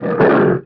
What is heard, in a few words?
Tiger Bite; Playable Tutorial Level; TR2